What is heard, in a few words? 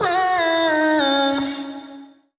Vocal Sample Pack Phrases B